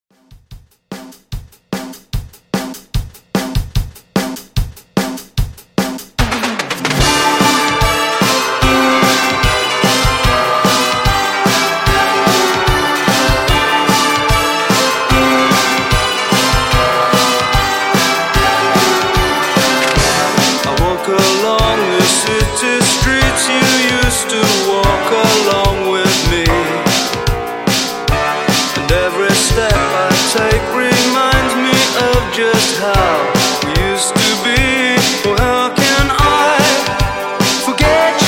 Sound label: 80s Pop Rock, 148 Bpm; ReDrum